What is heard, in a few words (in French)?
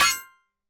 etoile gagnee